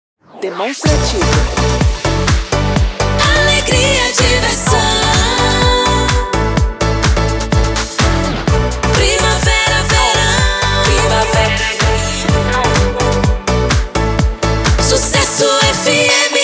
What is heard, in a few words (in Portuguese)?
vinheta primavera, vinhetas cantadas; PACOTE PRIMAVERA VERAO 2025; ALVIM PRODUTORA